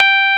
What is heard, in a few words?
Keyboards Old School, G4; USB Soundscan vol.09; AKAI